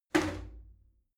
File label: shower slide door open hit soft